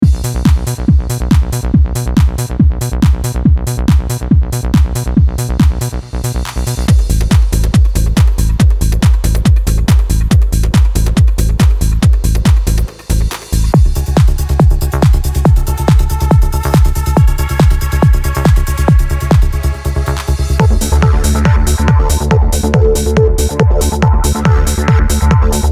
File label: Classic Trance Kicks